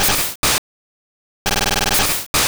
Razor Leaf

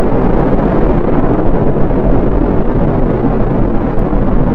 rocket loop

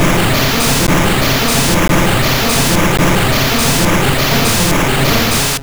Surf; sfx; attack moves; pokemon; gen 2; gsc

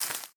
Foot Weeds Shoes Walk; Lucius III

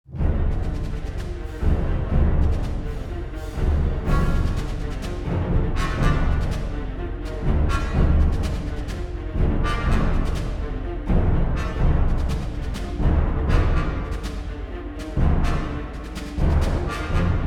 Space Battles Tension Loop

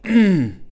priest argh

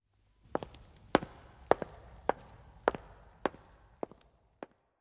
Footsteps Wood Walk Hall

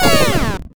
retro damage hurt ouch

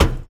footstep metal low run; SFX; Environment; MONO; Footsteps; ProSoundCollection v1 3

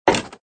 wood; tempala

